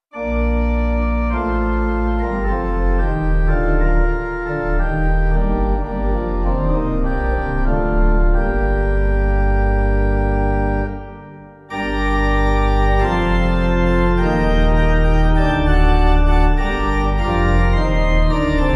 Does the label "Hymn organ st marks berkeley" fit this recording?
Yes